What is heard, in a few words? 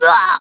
damage fly; Sheik